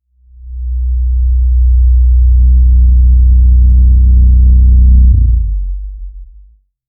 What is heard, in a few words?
a deep cinematic bass rum